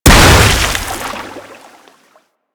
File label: Hammer Impact Water C; Warframe; Weapons